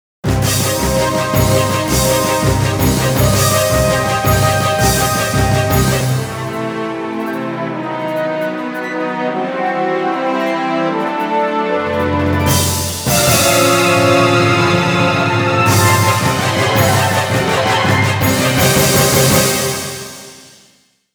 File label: Big Drum and the Fife Band; atelier lilie alcheist in salburg 3 original soundtrack